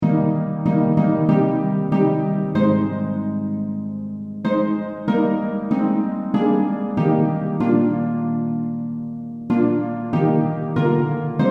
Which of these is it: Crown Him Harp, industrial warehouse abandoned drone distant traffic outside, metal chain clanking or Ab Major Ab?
Crown Him Harp